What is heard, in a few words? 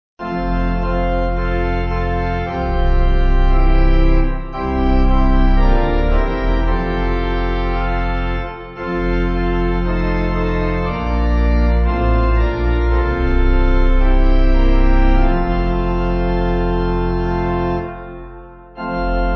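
Pipe Organ, Music Backing Files for Churches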